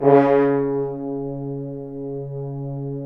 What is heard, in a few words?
BRS, FHns Accent; Roland L-CD702; Accent-Swell